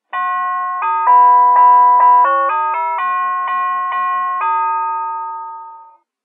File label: dixie, door chimes